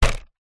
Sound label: vehicles motorcycle helmet set down hard visor vibrate; zapsplat